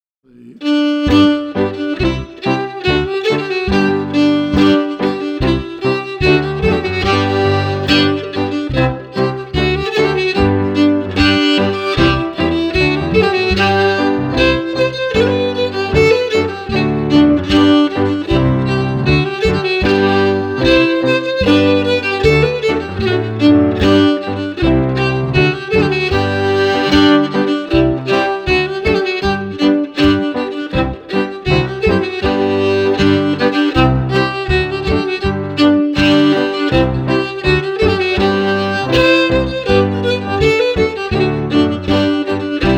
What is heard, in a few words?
FIDDLE PIANO; Jink & Diddle Book o' Tunes 2022